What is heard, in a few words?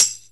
TB, 2 Step; USB Soundscan vol.51; House Side Of 2 Step; AKAI